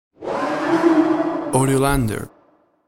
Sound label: Witch Cry Mid, A scary and haunting horror; A scary and haunting horror atmosphere with element of thriller, 80s horror films